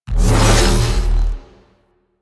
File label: Fire Charge; Warframe; Cephalon Weave; Weave Boss